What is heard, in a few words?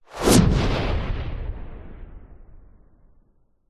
sound design whoosh short fast powerful crack long tail; zapsplat